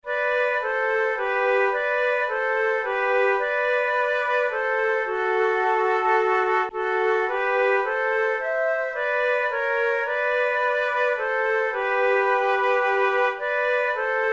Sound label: duet